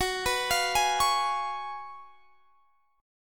Gb Major 7th Suspended 2nd Suspended 4th, Guitar Drop D